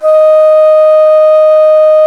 T. BONSURI, India Winds

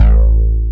M80 BASS

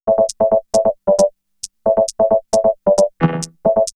Vocoder Loop; X-Static Goldmine; VocoderLoops

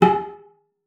CELLO FINGER; 90 s Sample CDs; Xsample VOL-5

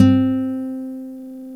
GTR NYL3 C, Nylon Chorus; Roland LCDP02 Guitar and Bass; Nylon String

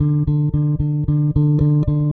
Eighth Notes D, Bass; Bass X

